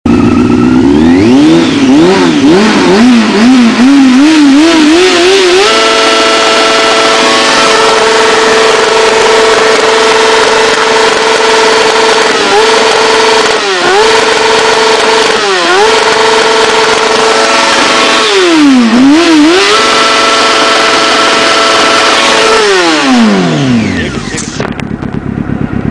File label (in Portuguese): hornet tirando de giro